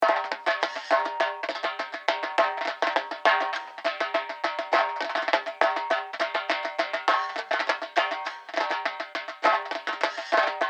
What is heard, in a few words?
arabic percs OUT